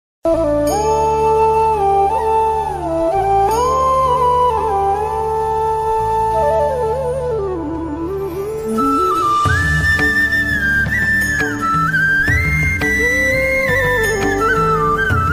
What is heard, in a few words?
bansuri flute instrumental ringtone heart touching flute; krishna; surya putra karna